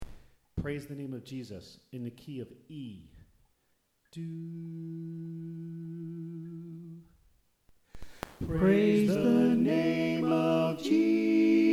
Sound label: Tenor